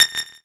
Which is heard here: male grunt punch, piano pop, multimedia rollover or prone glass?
multimedia rollover